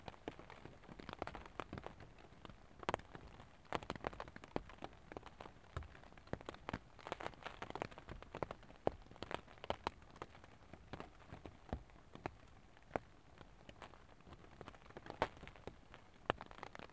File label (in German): zweite nacht regen